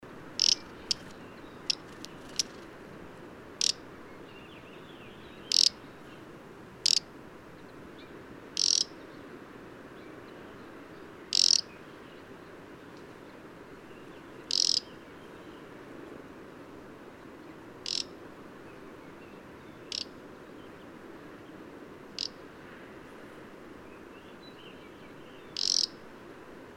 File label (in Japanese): オジロビタキ Ficedula albicilla